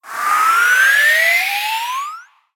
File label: slide up